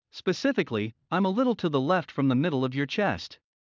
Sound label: Heart mid; Classroom humanbody